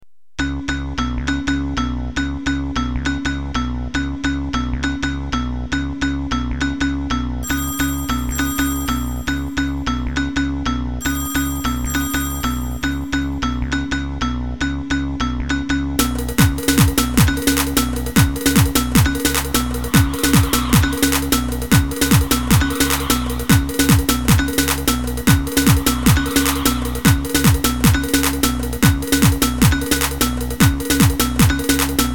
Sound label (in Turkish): zarbolar ritim; pa500 tr 9 8